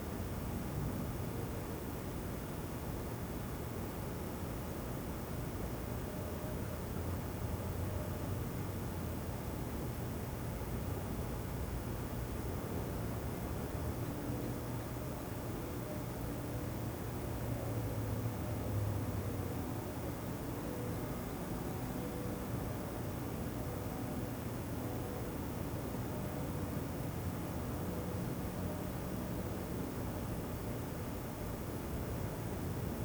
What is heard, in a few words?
Ambience Roomtone; bratz girlz really rock; ps2 wii; Svshops